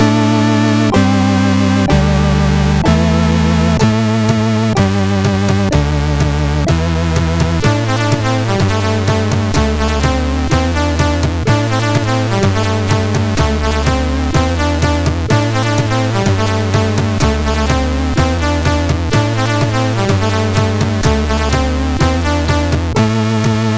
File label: Euroblues, sid CSG8580R5